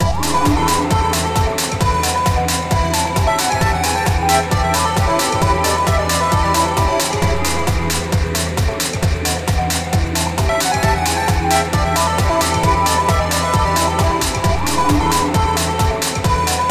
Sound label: Goal Theme; super mario strikers gamecube